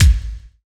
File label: BDRUM; E-Lab; X Static Goldmine 2; akai